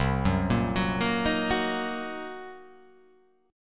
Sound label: C Minor 7th Flat 5th, chord